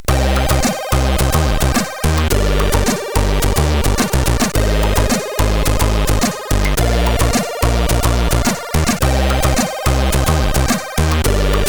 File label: sid; CSG8580R5; hvsc